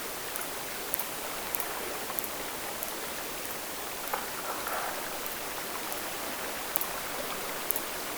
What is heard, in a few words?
Multisound x0.25speed trues beaked whale